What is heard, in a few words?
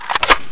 scar draw